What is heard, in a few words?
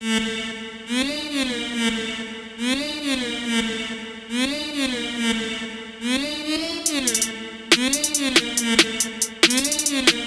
hz beats